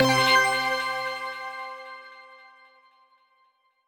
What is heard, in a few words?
Quest Complete